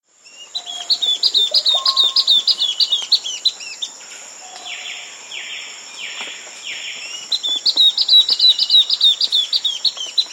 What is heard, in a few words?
Spot-Backed Antbird; S. America